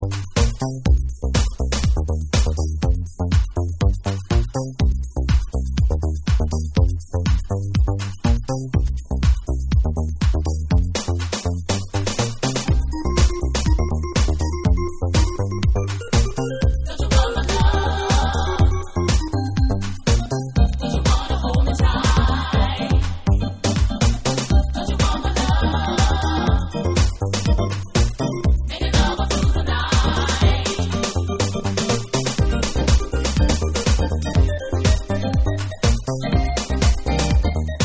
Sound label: Instrumentational Solo